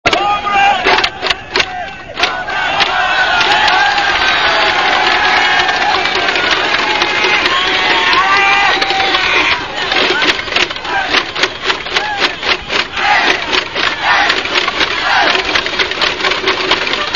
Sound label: hit